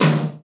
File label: TOM TOM